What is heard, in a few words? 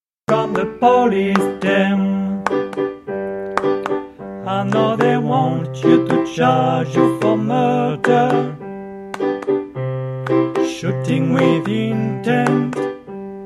Refrain Tutti